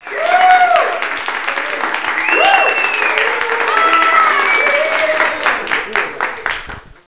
Aplauso

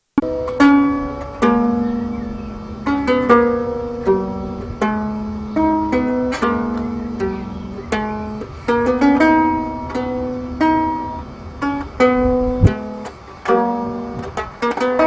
erro lira

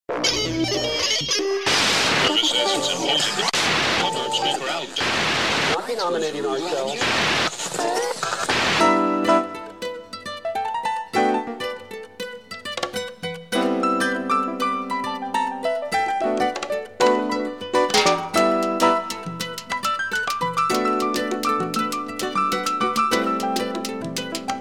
TRECHO SALSA; PGTIAE 2011 - Ritmos Musicais

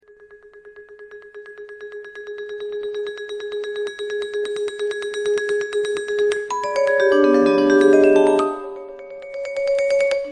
vibrafone; instrumentos

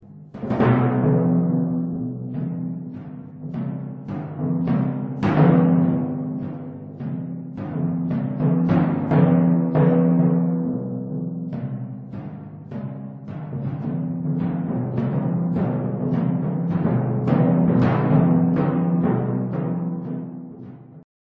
tímpano; instrumentos

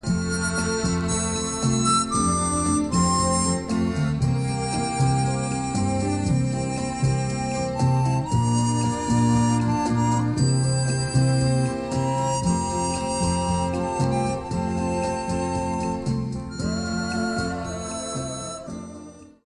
gaita; Instrumentos

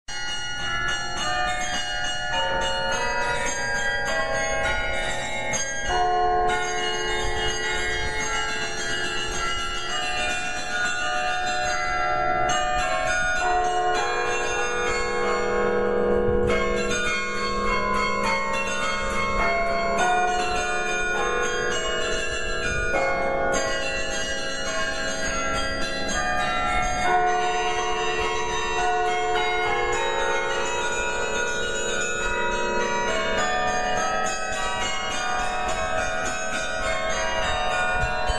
carrilhão msc; instrumentos

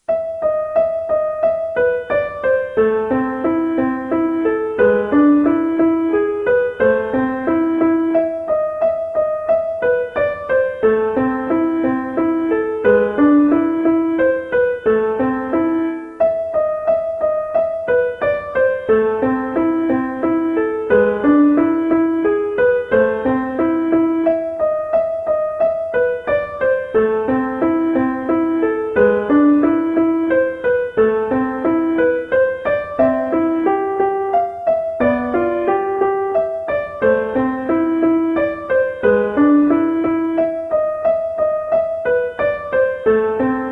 teclado

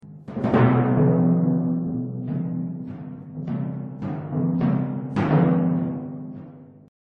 tímpano mini, instrumentos

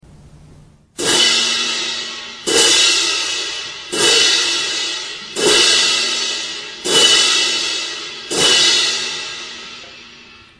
pratos; instrumentos

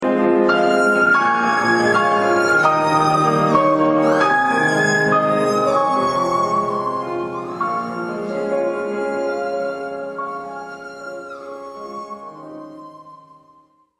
serrote mini

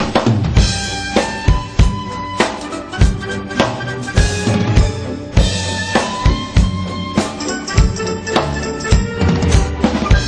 Bateria; Instrumentos

